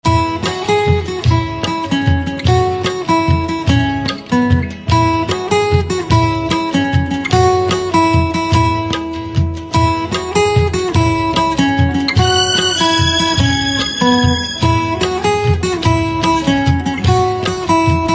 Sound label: Guitar